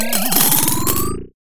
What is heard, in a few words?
Cash Bonus